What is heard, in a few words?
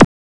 click